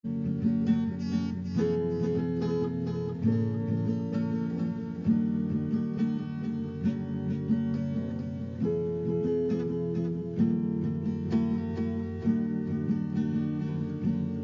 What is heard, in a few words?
Soft Strum